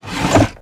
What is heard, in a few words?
Sound Drawer Close